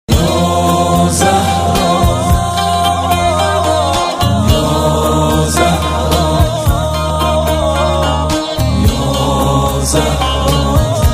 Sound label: ringtone